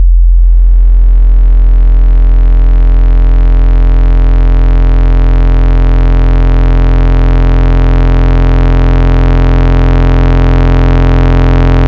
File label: Analog Arp Sine; Synthetic's Serum Collection; Echo Sound Works Core Tables